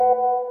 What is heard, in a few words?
notification; whatsapp; trimmed for games